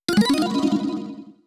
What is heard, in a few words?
get item